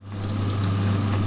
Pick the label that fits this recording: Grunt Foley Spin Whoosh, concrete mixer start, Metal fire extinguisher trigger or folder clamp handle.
concrete mixer start